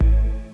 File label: Pad Kick, Drums